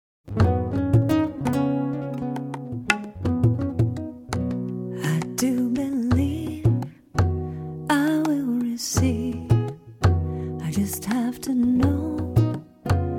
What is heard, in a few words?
Receiving Chant